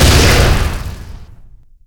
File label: spark shotgun shoot